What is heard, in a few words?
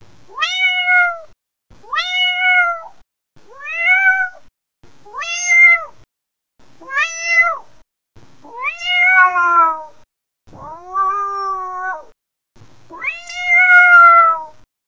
happy cat meow; cats